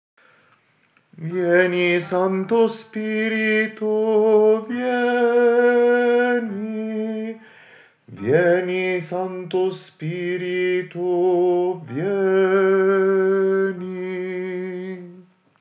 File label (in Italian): Vieni santo spirito tenori; coro diocesano